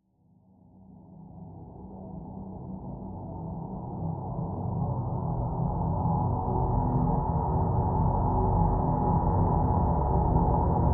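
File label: Drn Dimensional Simple E, drone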